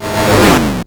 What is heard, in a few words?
Arcade Riffs